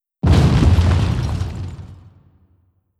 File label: airplane bomb